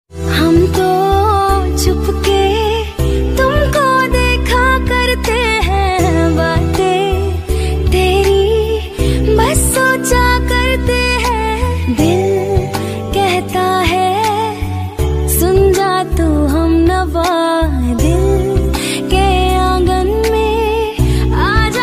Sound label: Female Ringtone